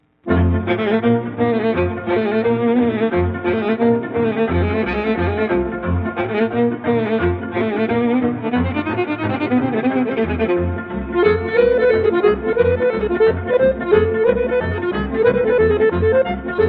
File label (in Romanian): Sirba de la Craiova, Roumanie